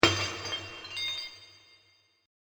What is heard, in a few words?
Prison Cell Ambience; Prison Door Sound Effects; horror sound effect